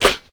fresh nice snare f key, drum single hits one shots